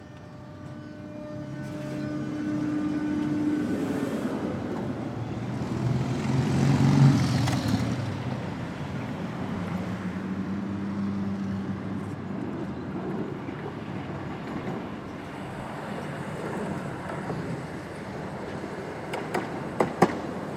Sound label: Traffic With Tramways And Bikes